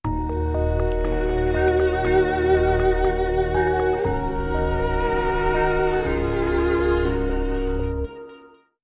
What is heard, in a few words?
Dark Sting